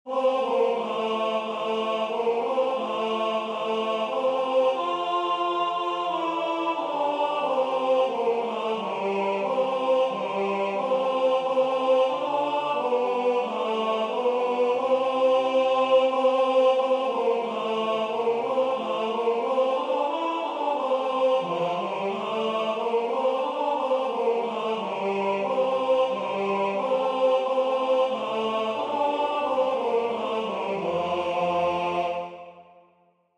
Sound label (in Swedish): TENOR